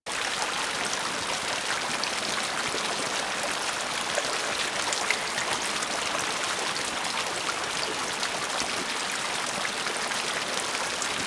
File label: dungeon island waterfall landing loop; Ambience; Props; Warframe; Duviri